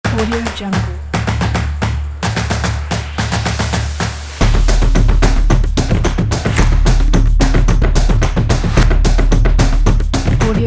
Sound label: percussion